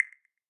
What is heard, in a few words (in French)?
Clic, Sonneries